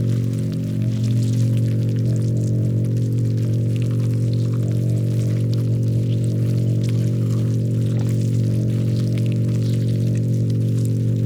ant ship construction idle; biogenesis

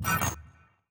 archeology log entry neutral; ancient relics

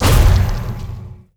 ui demolish building district; Stellaris

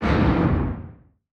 distance laser turrent fire; weapons; Stellaris

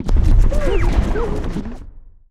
auto cannon hit small; weapons; Stellaris

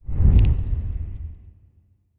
big legs swoosh up; biogenesis

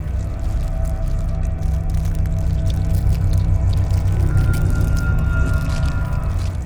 kaiju egg destroyed loop; biogenesis

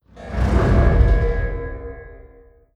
distance shieled fire layer; apocalypse

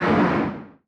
distance crystal projectile fire, weapons; Stellaris

